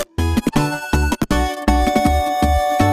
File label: notification